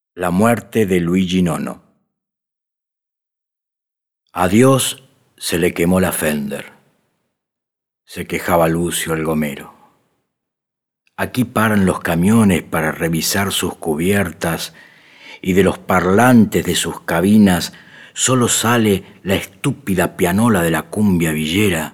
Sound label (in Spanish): Pianoforte